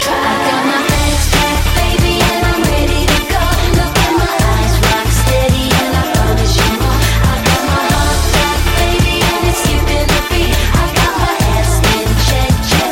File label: hiphop rock mix